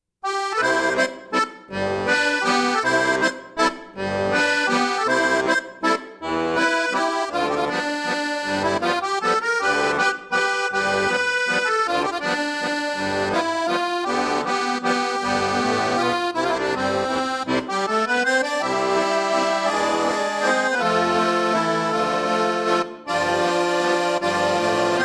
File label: Mexican Accordion